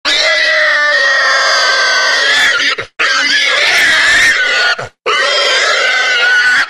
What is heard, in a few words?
Wild Boar Sounds Car Horn